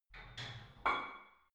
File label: barbell light plate put on hit reverby soft